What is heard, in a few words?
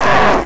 pain, player; midnight guns